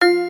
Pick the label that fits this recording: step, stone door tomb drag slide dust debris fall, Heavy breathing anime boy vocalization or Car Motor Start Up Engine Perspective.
step